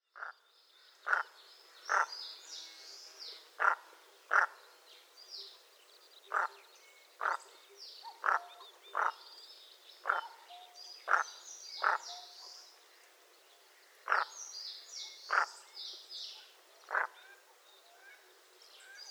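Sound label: Common Merganser, hokkaido; kawaaisa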